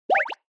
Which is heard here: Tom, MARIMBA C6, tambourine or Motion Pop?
Motion Pop